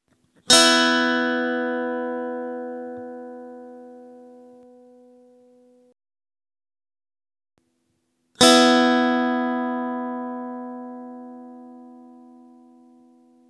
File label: string